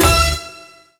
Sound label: Win Show; MexicanaChillitime; ClubFusion